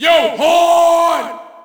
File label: Shout, audience; UFC Tapout 2